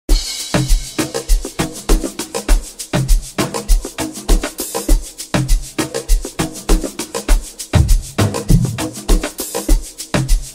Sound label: Amapiano Remix